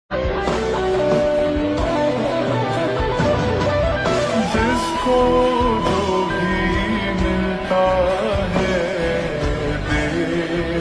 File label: Ringtone Slowed Reverb